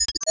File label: Science Fiction Sci-Fi Electronic Computer Blurp